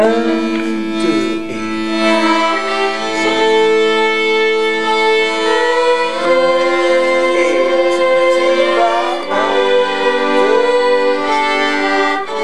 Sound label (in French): Caraibes - intro violons lent + rythmique